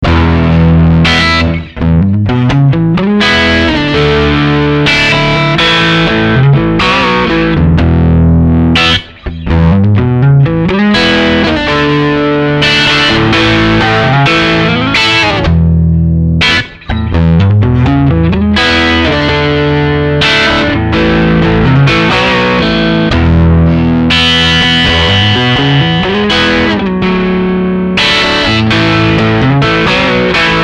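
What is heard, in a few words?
crunch, guitar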